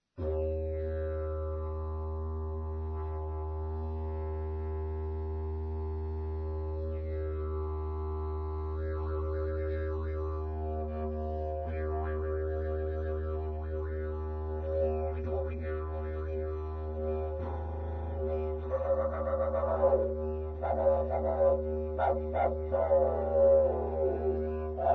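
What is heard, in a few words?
pvc D Sharp, didge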